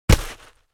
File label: projectile hit sand